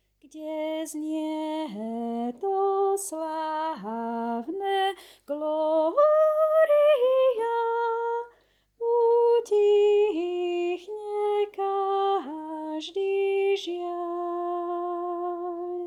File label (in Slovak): Sopran; Znie nad Betlehemom Gloria